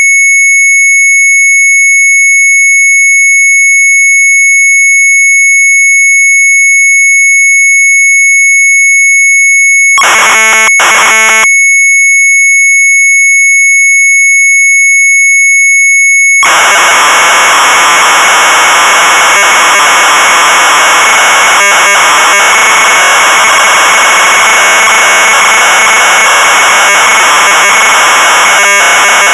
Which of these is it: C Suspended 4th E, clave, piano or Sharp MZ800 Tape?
Sharp MZ800 Tape